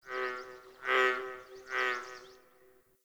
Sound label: night tree critters; Ambience; Warframe; Eidolon Plains